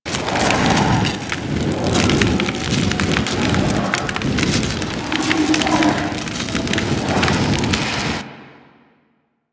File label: Fire Trail Loop; Warframe; Warframes; Nezha